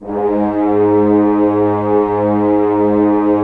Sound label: Horns; Roland - LA Composer vol. 4; HZ Horns